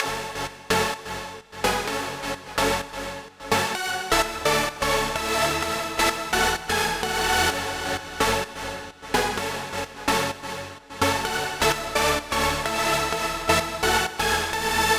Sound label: synth chords, Key A Bpm 128; Loop Nerds; Big Room House Allstarz